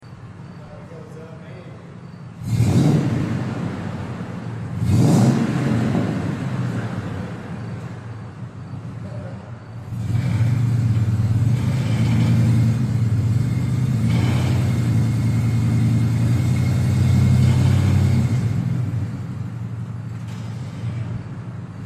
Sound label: F150 5.4L Aero Turbine Duals, exhaust sounds